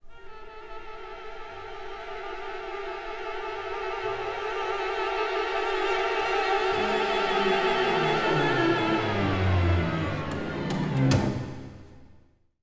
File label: STRINGACCENT, Orchestral Loops; Best Service ProSamples vol.33; AKAI